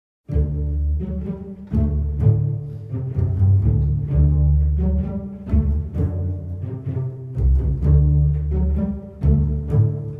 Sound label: applause; Kill La Kill